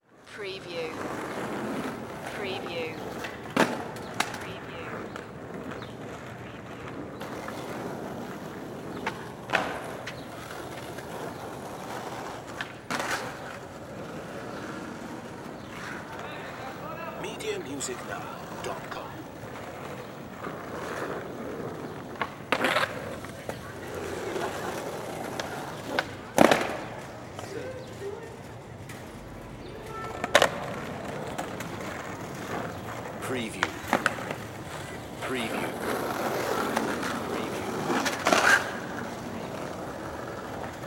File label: City Park Skateboard